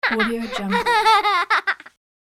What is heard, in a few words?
toddler giggling